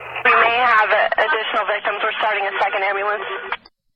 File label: wounded; Ready Or Not